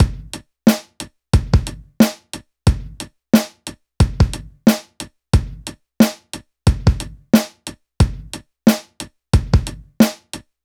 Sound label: Rock loop; Reference Beat